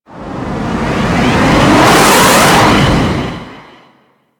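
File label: Stanchion Ship Rocket Projectile Whizz By; Warframe; Vehicles; RailJack; Proc Ships; Corpus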